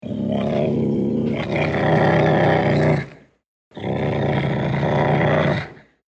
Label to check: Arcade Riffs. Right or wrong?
Wrong